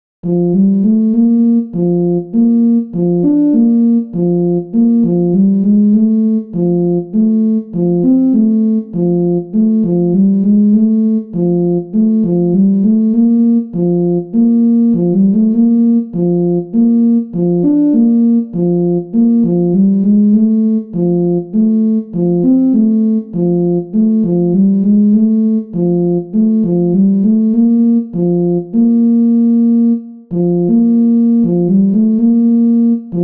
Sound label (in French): bal populaire basse